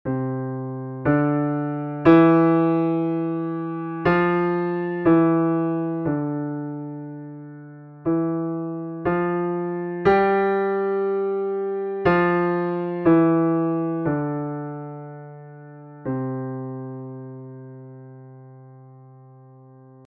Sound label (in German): lied dynamik